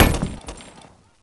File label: grenade impact; tf2